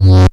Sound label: Tonal FX F#